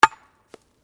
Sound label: Digging Pick Concrete Hard; Various digging tools and processes; Construction